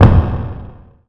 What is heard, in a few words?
walk; Unreal; Firestorm 2014; FSPack; biosuit